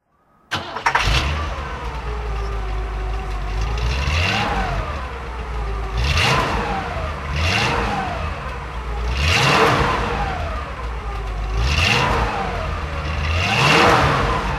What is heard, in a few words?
cadillac deville throttle; Cadillac Sedan de Ville, 1959; Engine sound; V3D/ ClassicarImages; Marc Vorgers